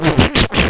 gauss spin, weapons

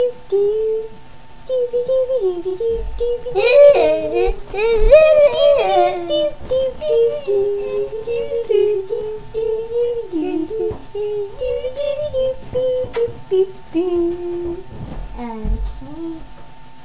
weirdsong; Quark RB; nerd

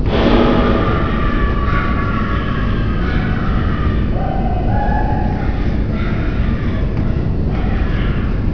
far scream

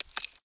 npc gear

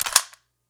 car15 mag in, weapons

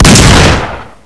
870 buckshot

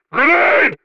throw

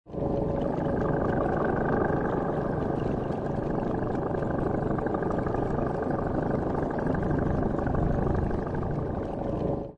Alien

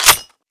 bolt; elf ranger

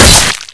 player impaled

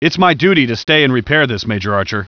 duck jump guy

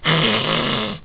hiss

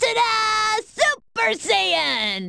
scream; goten

